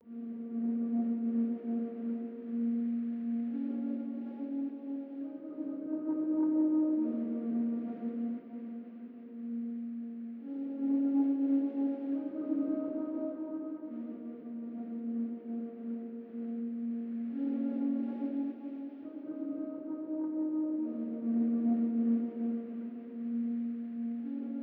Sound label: 139 BPM A# Min Pad; Cymatics; Drill; Savior